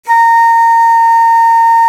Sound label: VENU A#4, India Winds; E-MU Producer Series Vol. 7 – Old World Instruments